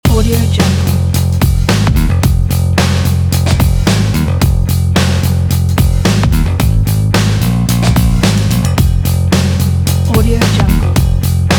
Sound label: breakbeat background loop